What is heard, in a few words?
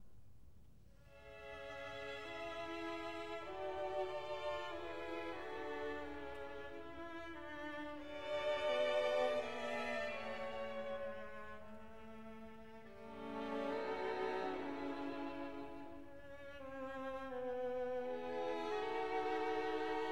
Piano Concerto, Intermezzo